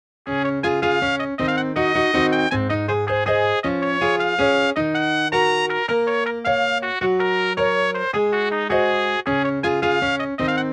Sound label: Trumpet Concerto; byu theory; Arsmithian